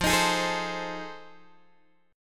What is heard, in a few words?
F 7th Sharp 9th, Guitar, Open G